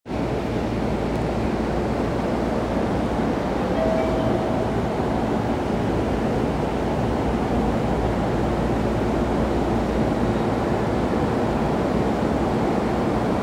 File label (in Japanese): JR中央線快速国立東, train sounds